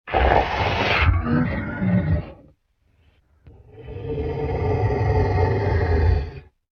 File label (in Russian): rychanie drakona